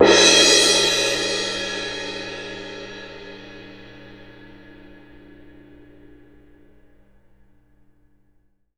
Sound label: CYM MF, Percussion; ILIO; Synclavier Percussion 2; World & Orchestral